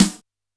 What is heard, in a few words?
Open Rim Shot, Standard Kit, Yamaha PSR-E323